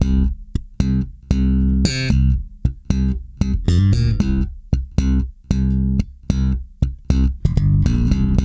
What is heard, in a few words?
THUMB C, Bass; Spectrasonics - Bass Legends CD 2